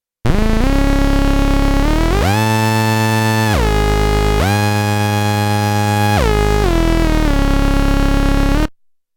Bend Bass; KOPRO+

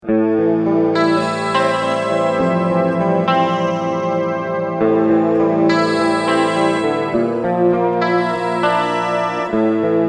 Sound Mode Slow Pad

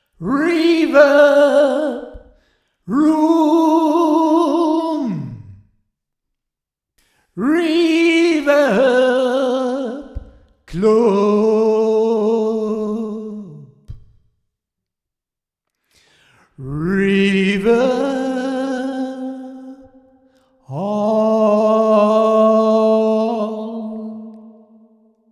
Reverb

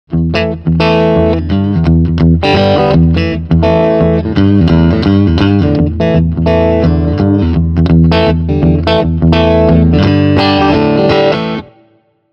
Clean

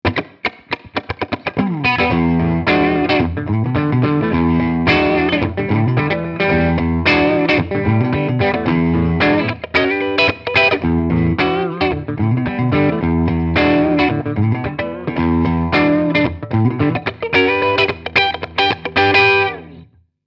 Harley Benton Tube 15 Les Paul Clean

